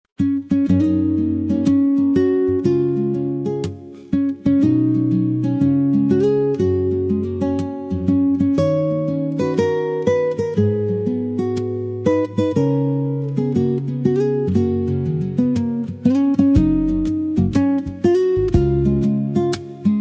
Happy Birthday Guitar Melody Soft, instrumental Version